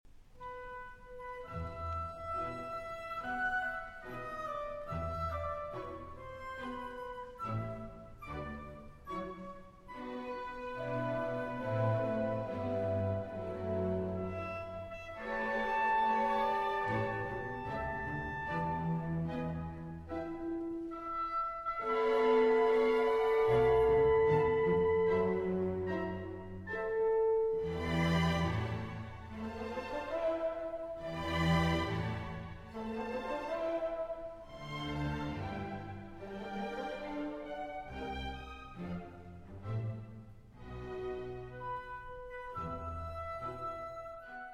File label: Piano Concerto in C Major, Tema Con Variazioni (Live); Live in Australia, 2000